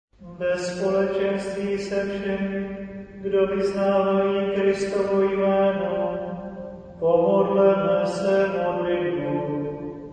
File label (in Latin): preceptis choral